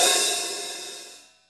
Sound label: HH OP, Drum Dry; East Collexion; Drum 1